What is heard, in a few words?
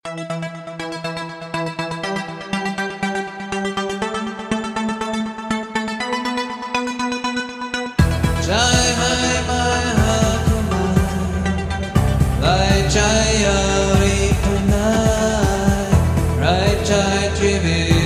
Rock On